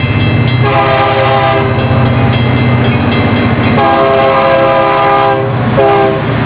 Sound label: choo choo